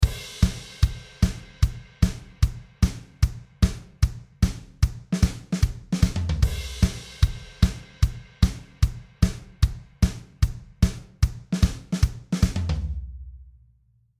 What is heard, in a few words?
Shuffle